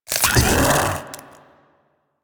Splitter Split; Warframe; Infested; Grey Strain

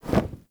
Vendor Leather Flap; Ambience; Soul Plane

